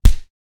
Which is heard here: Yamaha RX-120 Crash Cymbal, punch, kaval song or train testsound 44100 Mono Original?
punch